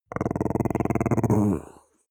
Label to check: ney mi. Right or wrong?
Wrong